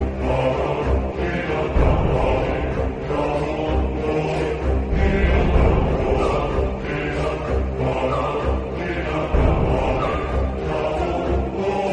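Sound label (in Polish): iphone 12 plus, dzwonki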